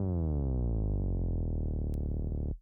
Bass Drop

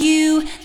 vocal

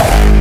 hkick G#4

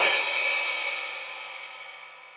crash

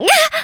combo break

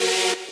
saw

